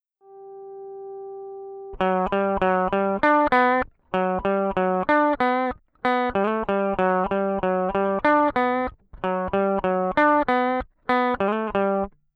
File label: Lead DI